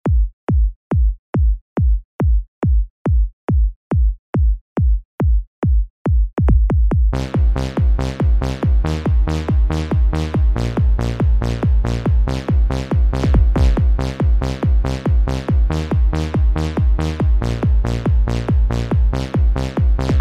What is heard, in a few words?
Disco